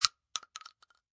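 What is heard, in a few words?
Bullet Shell Drop Shotgun 12 Gauge Cement; empty Shotgun 12 Gauge shell falls on cement; Impact Sound Effects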